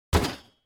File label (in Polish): slabe uderzenie